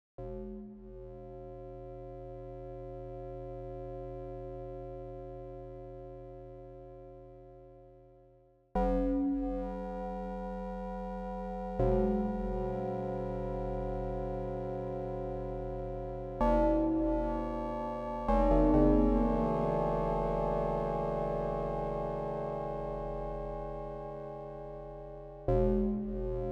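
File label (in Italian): esempio Max synt FM; MaxMSP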